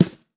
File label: Birch Brush, snare; Drums; Ghosthack - Free Snares 2023